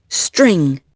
string

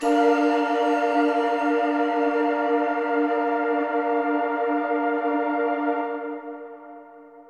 SYNTH PAD AMBNT 160 C, Ambient; Sphere

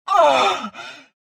pain; JK2; Aurra

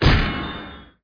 hercules hit; Zeus

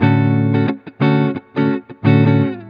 Clean Electric Rhythms, Rock Guitars; ACID MUSIC

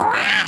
Duck; breakout; farm

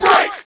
BREAK; SOMC Family Forum

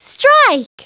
strike; Treasure Galaxy

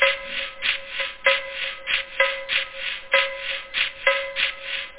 shaker; da capo vol1

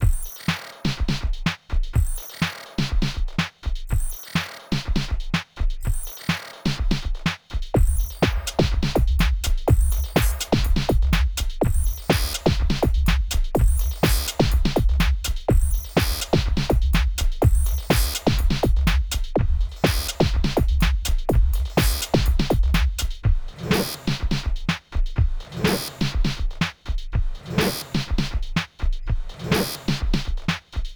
Offbeat

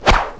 throw; sfx; Universo Animado

